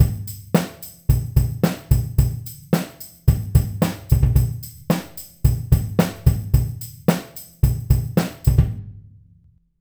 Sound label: Motown DRY